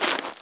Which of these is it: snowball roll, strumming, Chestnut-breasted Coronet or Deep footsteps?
snowball roll